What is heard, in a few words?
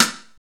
JACK STICK, SIDESTIKS